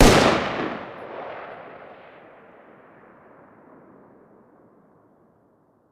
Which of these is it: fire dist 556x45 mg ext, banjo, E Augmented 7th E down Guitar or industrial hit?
fire dist 556x45 mg ext